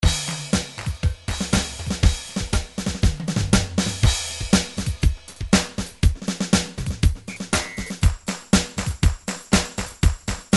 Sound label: drum grooves midi loops country; Steinberg Nice Beats 1000